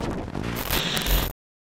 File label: Erased Data, sound effect